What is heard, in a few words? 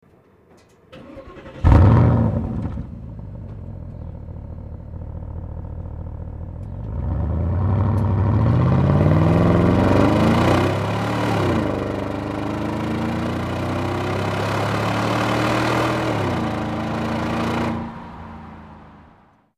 cayenne sound, Porsche